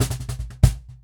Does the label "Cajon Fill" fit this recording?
Yes